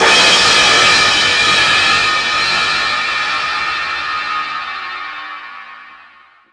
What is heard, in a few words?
cym, drums